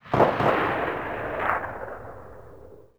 mg 1shot echo

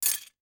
ui drop metal; Rust

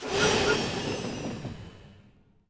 Mod Armour Heal; Civ4 warlords

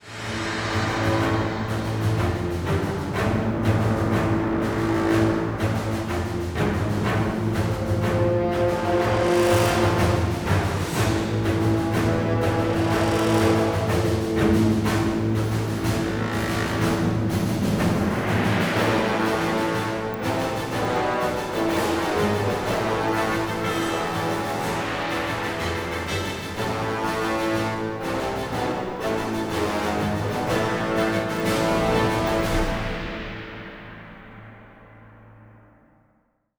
cutscene music

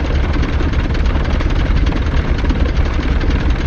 Packard Merlin Prop Engine; DCS

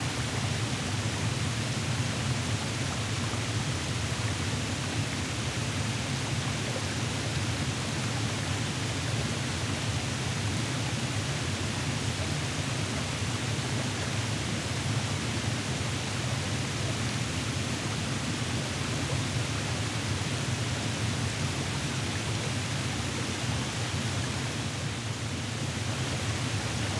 waterfall top; Rust

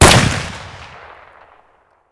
toz fire close; Escape from Tarkov

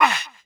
grunt light hurt

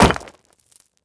concrete; Rust

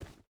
footstep rubber boots dirt jump start; Rust

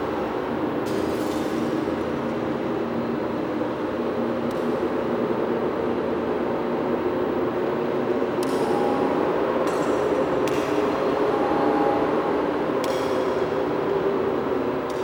ambience large hall

